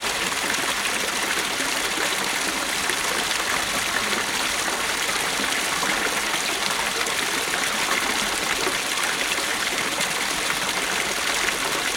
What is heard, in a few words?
storm water from pipe onto beach